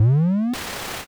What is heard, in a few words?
machine start